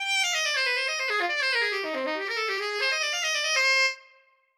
lick; mario kart

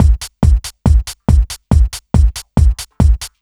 Kick Loop, 140bpm

